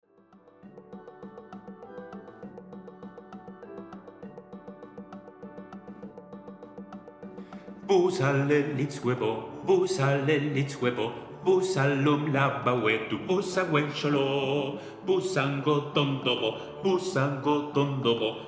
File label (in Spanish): alto; REYLEON